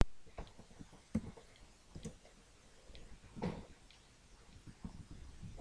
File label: giggle